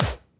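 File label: Punch In Da Face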